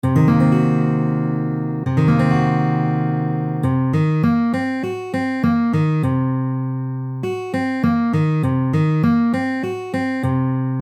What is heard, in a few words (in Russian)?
Septakkord Cm7 5